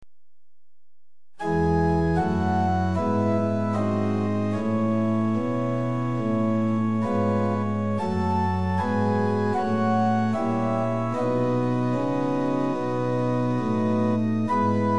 Liturgical Organ Books, Pitch 440 Temperament Equal; Prinz 8 4; hymn